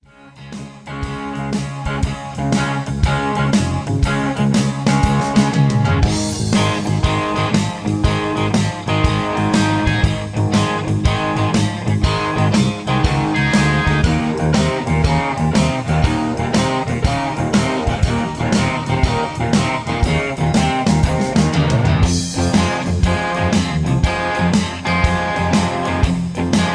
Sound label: I-V-VI-IV Texas Boogie G 120; Peach Jam Vol 1 Part 2 Texas Boogie